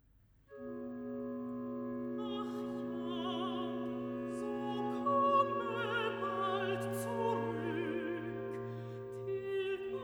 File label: Recit (A)